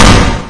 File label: bang, Gunshots